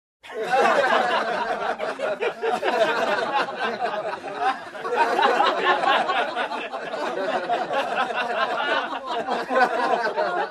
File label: Group of people laughing